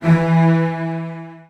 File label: E2, Virtuoso Strings, 16TH; ILIO; Kirk Hunter; CL 16TH